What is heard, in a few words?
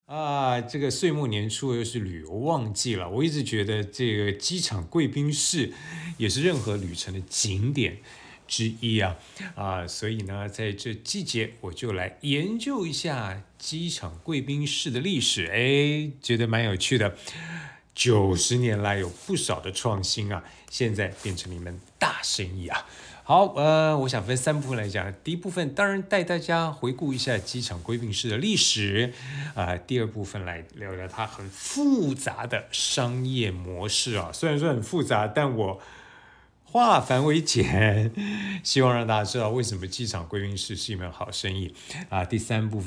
airport lounge